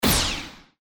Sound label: bounce